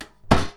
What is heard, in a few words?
shield hit; Combat